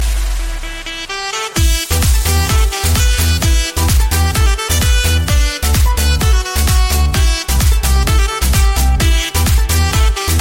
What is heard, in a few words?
Xiaomi Redmi Note 9s, ringtones